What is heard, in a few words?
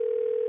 windows xp ringout